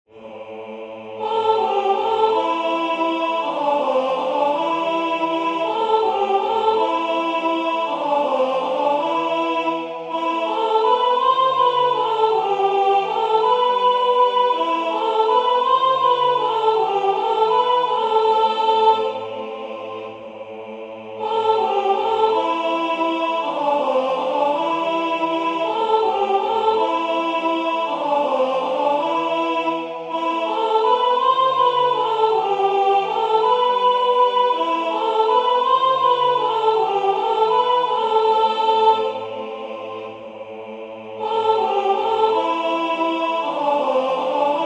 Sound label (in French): En toi Seigneur mon esperance soprane